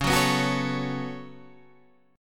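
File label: B 7th Suspended 2nd D flat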